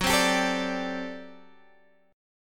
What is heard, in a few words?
G Major 7th Suspended 2nd Suspended 4th, Gb